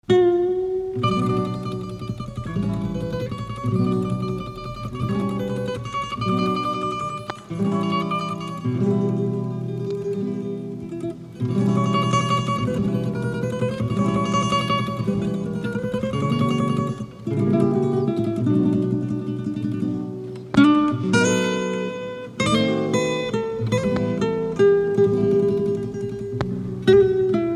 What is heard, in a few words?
instrumental, persian